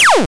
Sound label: Laser